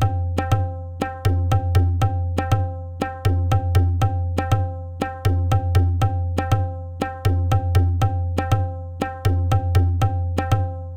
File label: Banghra Drum 120 BPM; перкуссии percussion loops